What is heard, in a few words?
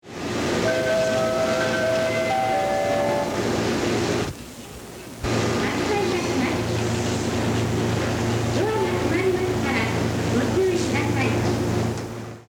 yono melody, station; jreast